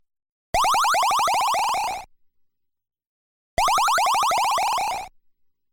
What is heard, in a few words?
Warping in the Extradimensional Well Sound; Kaijuu Densetsu The Tale of the Shell Monsters